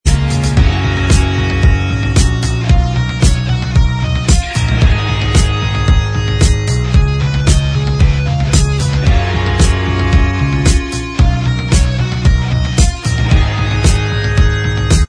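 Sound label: instrumental track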